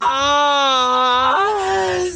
aahhhhhhaaamm

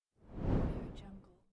swap

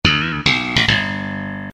sitcom transition bass riff; seinfeld

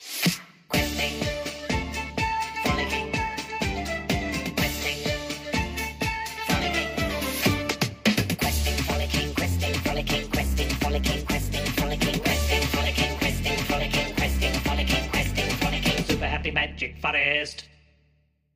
ending theme; super happy magic forest

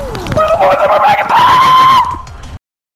inventing noises

